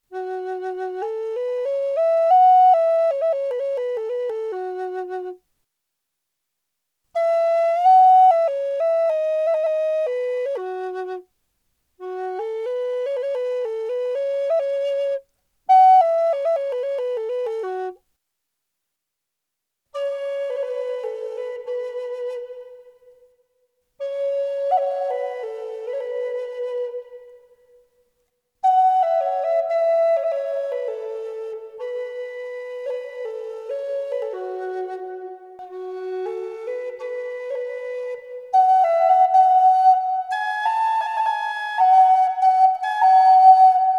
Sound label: ukraine flute